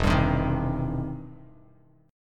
E Minor Major 7th Sharp 5th, E, down, Bass Standard; EmM7#5 strummed